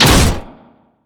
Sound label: axe grab bump oh; Darius